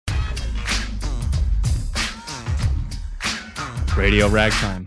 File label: hiphop